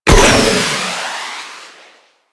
Space Fighter Coil Agent Rocket Fire; Warframe; Enemy Ships; Space Fighter Sentient Coil Agent

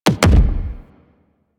Weapon Punch Double

